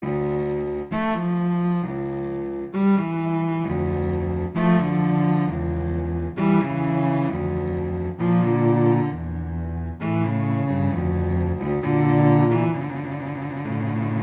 Adagio; Telemann; Canonic; Sonata 1

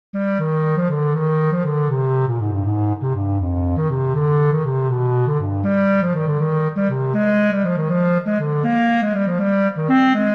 Gigue; Bach; Suite 5

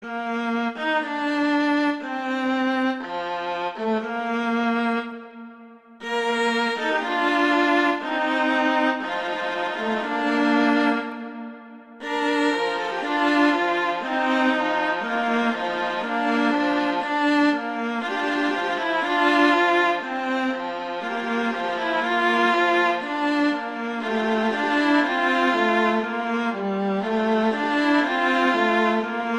Largo; Telemann; Canonic; Vla; Sonata 6

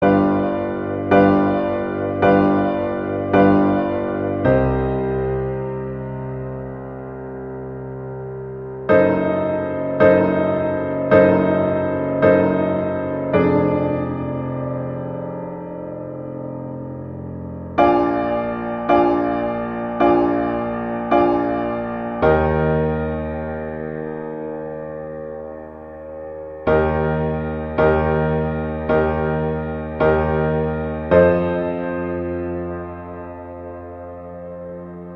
Largo; Vivaldi

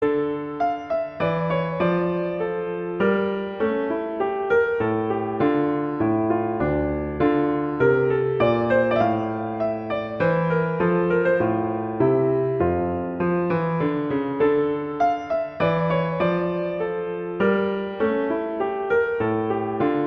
Minuet; 12 Pieces

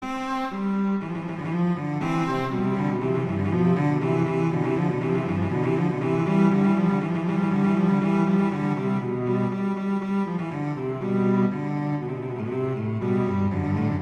Allegro; Telemann; Canonic; Sonata 2

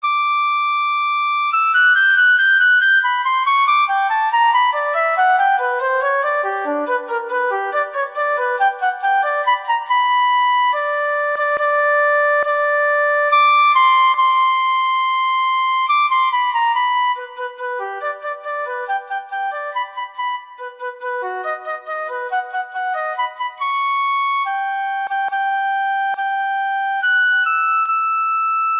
Allegro maestoso - Cadenza; Mozart; Concerto K313

